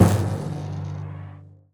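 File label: electric timpani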